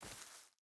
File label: footstep barefoot grass walk; Rust